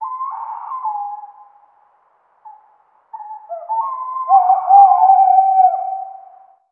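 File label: animal nocbird distant; Call of Duty Modern Warfare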